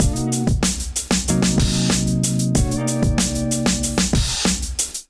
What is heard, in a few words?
Dobbs Kit, Drums; Ableton; Factory Packs; Chop and Swing